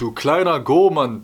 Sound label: hurt; spackenmobs; apored